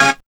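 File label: tek chord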